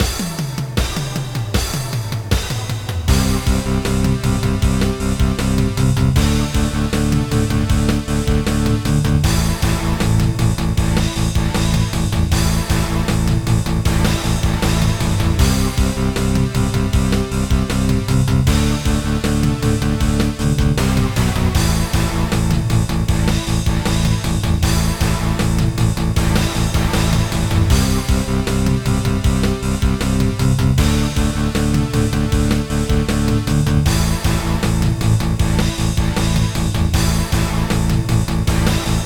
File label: Battle; Fresh Precure Asobi Collection DS gamerip 2009